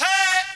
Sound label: cockpit